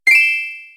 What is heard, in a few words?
Mining Reveal Item; infinitefusion